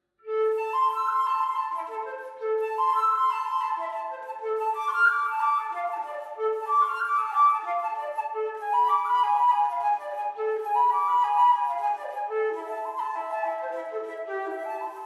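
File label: Prelude in C minor BWV934, harpsichord; JS Bach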